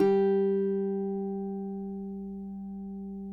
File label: cuatro; Spectrasonics; Hans Zimmer Guitars VOL-2